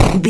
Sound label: retro impact hit general